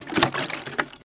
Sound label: Bloodbank Touched